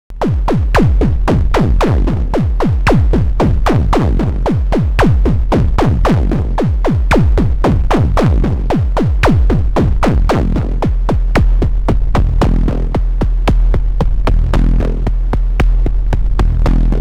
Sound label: Behringer Edge